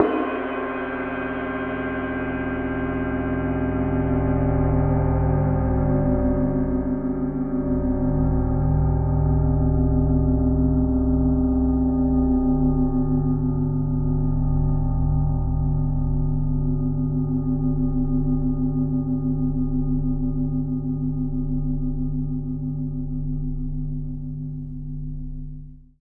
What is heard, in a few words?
Cymbal Ambience; Sonic Foundry (Sony Creative Software); The Electronic Music Manuscript Collection